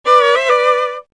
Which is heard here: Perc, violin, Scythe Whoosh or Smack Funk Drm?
violin